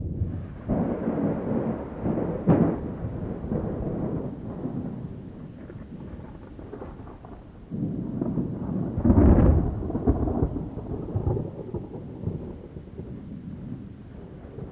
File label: Thunder